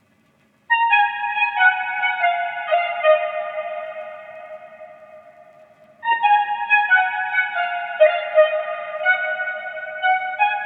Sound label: R&B Melody Loop 90 BPM D# Min Lead; Melodies; Loop Stems; Cymatics; Oracle Sample Pack